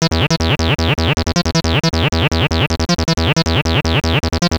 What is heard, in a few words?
x0x acid